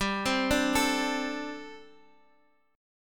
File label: G Suspended 2nd Suspended 4th G